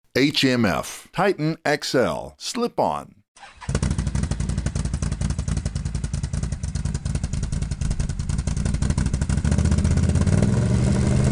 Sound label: outlander 1000 titan xl slip on